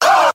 "oh", group of men

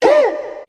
ooh, Voice

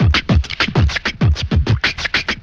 delorean, Beatbox, Drum loops

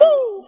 "woo", voice

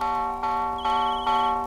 looped alert; Zaps and Beeps

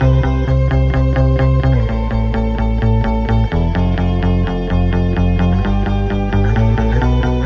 keys, Phonky loops

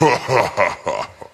laugh satan

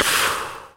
thwack, Ticks; Misc Percussion

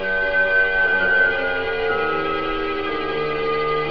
strings creepy; Sad; Loops

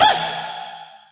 house classic, Stabs

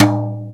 Toms

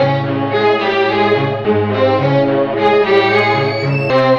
strings, Sad

